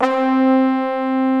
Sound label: SYMPHONIC BRS